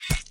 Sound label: Butler Stab